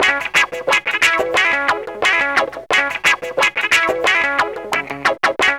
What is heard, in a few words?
GTR LOP; E-Lab; X Static Goldmine 2; akai